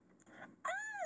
Ahh

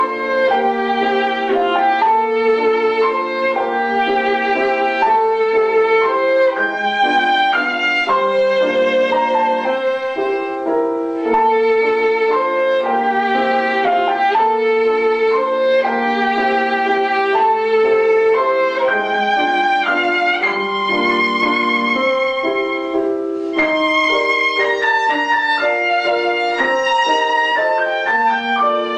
Lullaby

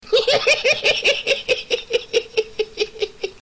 laugh; Joker

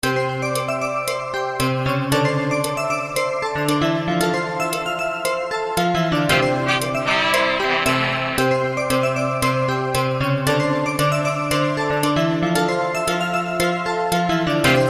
Latin Bells

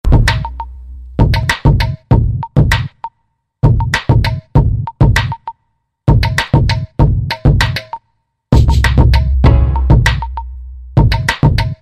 Awesome Beats

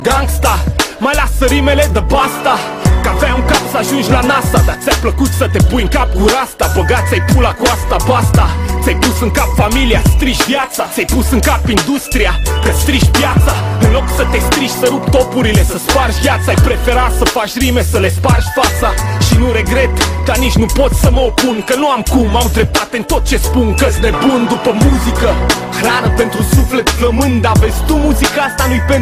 Doc Muzica

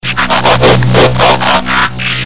Transformers sound

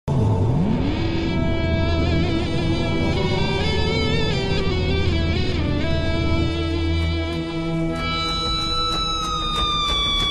Metal Theme; Halo 3

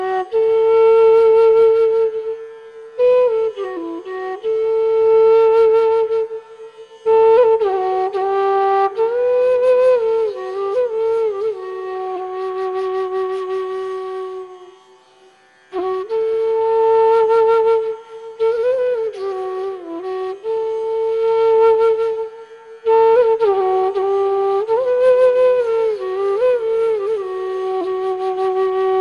Best Flute; Agneepath

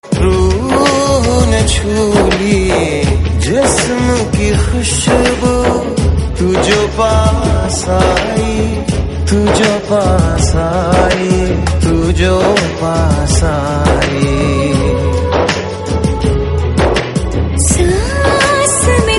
Saans Male

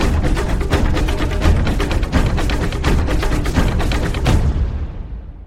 drums; Jumanji

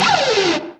Bullet; Ruby

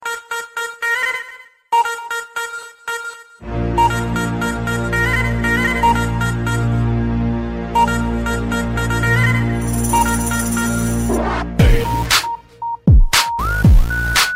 Hardest Bass

